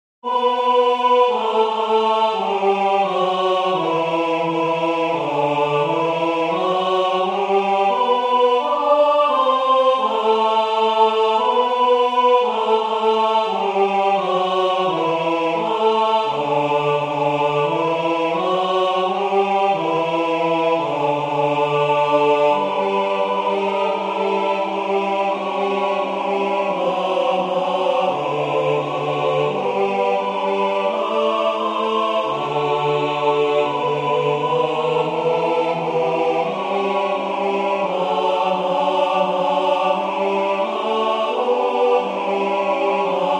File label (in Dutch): Tutti, koor; E.Elgar; Jesu Lamb of God